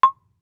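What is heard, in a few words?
Marimba deadstroke fortissimo C2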